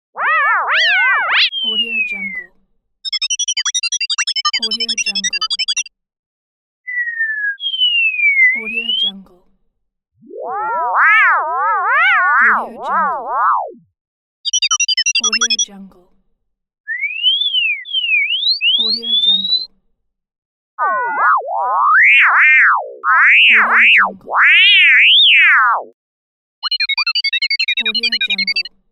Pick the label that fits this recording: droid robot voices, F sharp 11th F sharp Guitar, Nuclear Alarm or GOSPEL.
droid robot voices